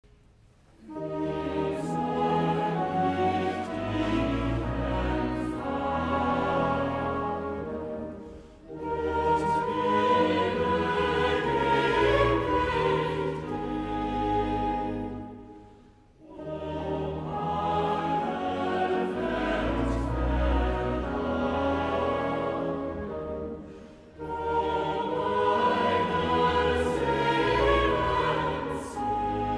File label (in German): choral; el dia de nadal segons bach